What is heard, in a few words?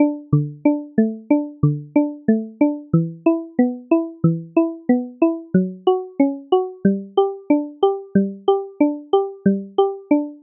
piano minimal; open piano